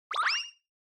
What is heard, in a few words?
Motion Blow Away Short